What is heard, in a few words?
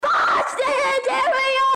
boston terrier